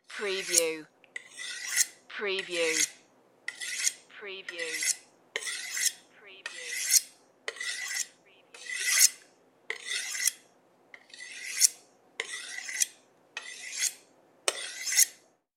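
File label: Maniac Sharp Knife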